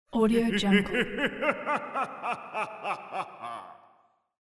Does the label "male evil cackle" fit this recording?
Yes